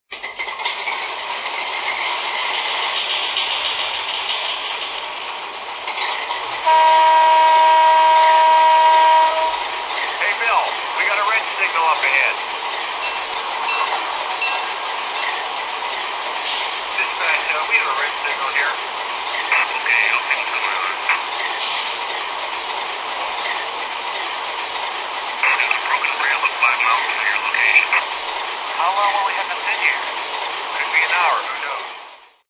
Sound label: Alco FA1